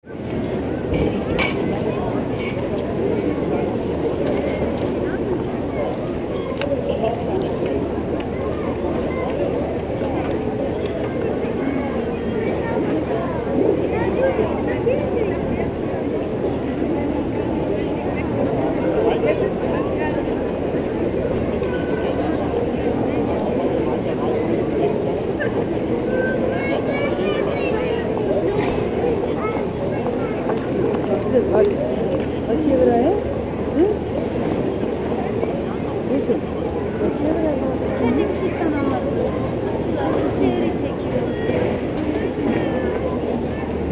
marketplace

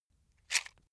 matchbox rattle

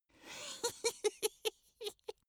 dwarf laugh sneaky

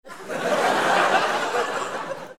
laugh

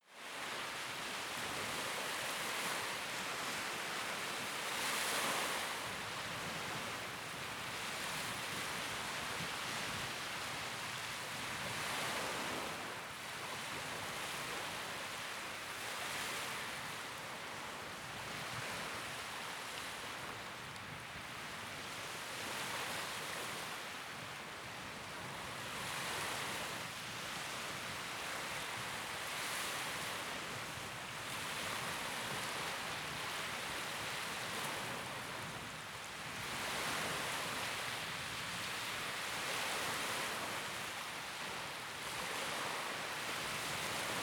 beach waves soft dog wind

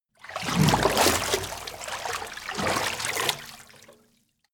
moving

